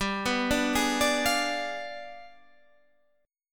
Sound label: G 7th Flat 9th, Guitar, Standard; arpeggiated; JGuitar